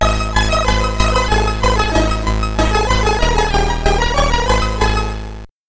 Game Over Badinerie from Orchestral Suite No. 2 in B minor (SID Stereo); Bomb Jack; Commodore 64; BWV 1067